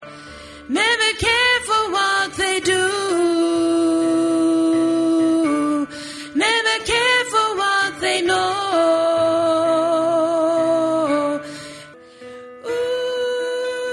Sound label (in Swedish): bas, stammor